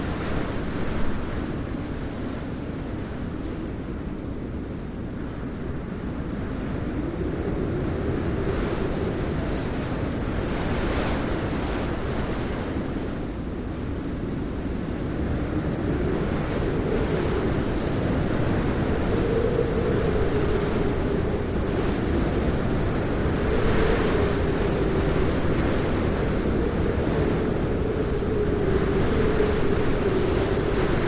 wind med

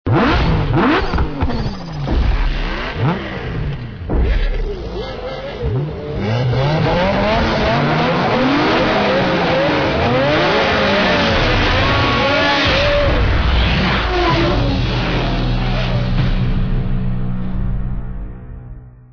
car start

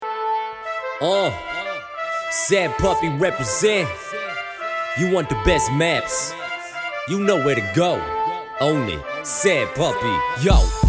rap

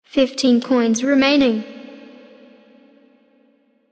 coins; unicorn

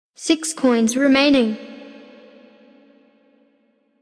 coins; unicorn

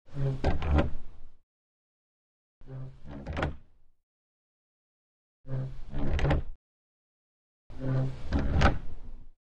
squeeq

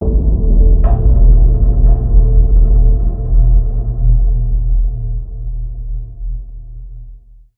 muffled clang

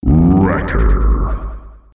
wrecker

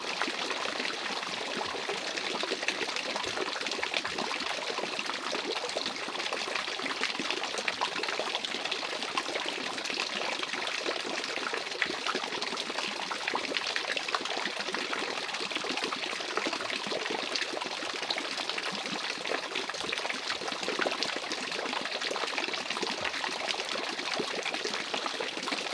waterfl

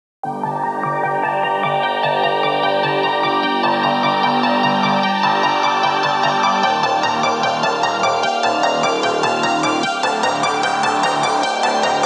skull dubstep

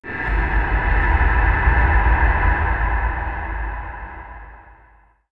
abstract hit, ambience

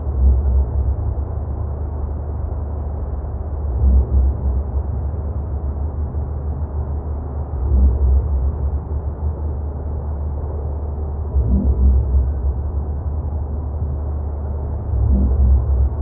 city tone, ambience

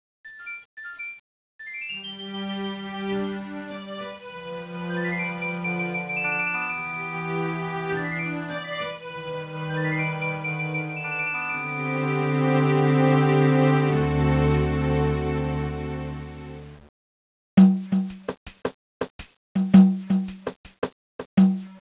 clocktown; wRath

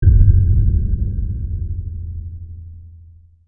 muffled clang

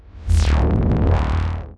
distorted

